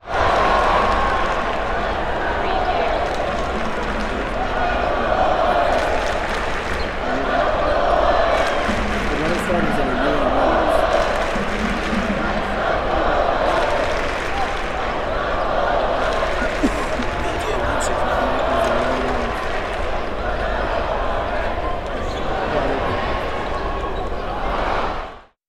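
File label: Arena Crowd Noise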